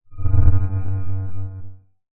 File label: Sci-fi Transition